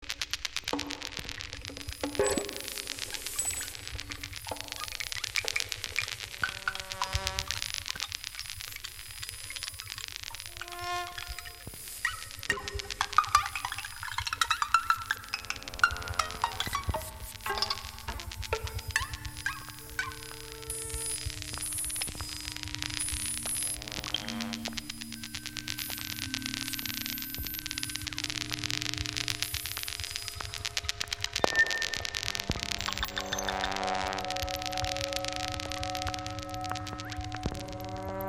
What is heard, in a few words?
Noise Electronic Music